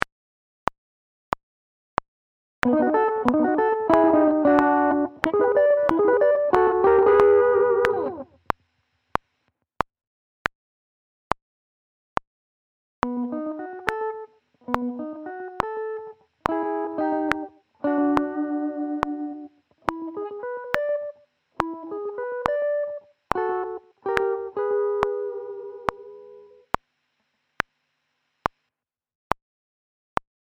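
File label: Sweeping, guitar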